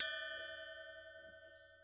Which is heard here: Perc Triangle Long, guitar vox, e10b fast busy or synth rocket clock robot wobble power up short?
Perc Triangle Long